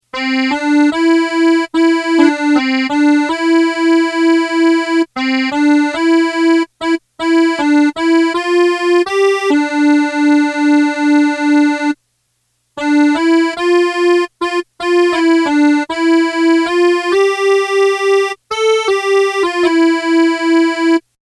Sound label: melody